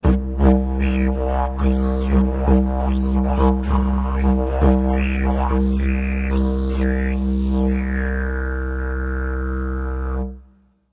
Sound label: usdidj, didj; didjmaking